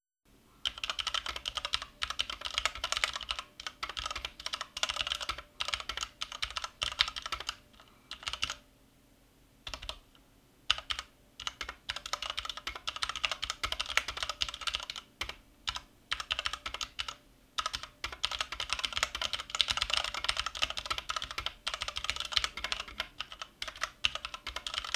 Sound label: Corsair K65 RGB Mini